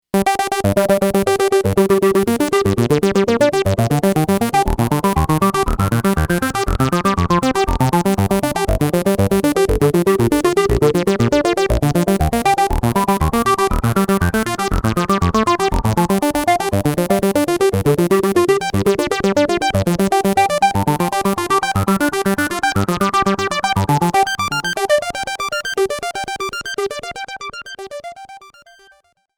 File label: sweep arp